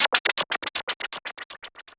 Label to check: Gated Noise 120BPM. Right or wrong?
Right